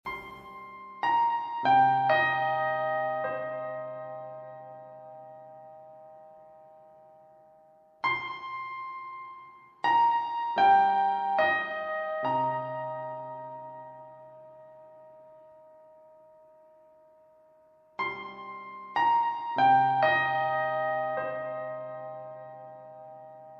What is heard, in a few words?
piano